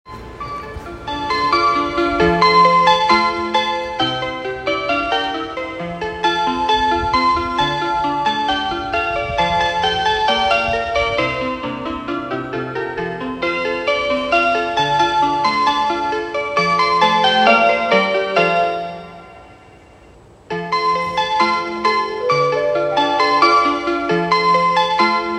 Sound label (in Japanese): 京急本線平和島北接近, train sounds